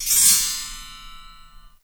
spring coil, percussion; Misc Percussion

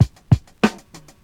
mash out, Drum loops Boombap

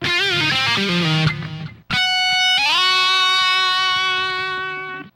guitar scraper, Loops, Phonky

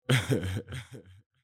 laugh

